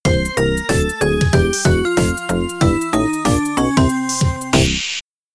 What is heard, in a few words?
Underworld Game Over; brian the lion; amiga